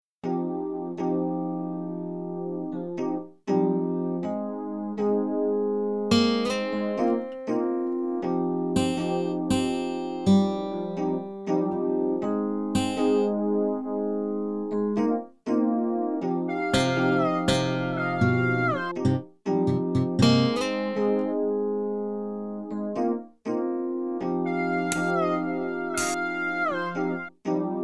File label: Metronome test flute remix